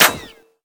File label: impact helmet ric; Escape from Tarkov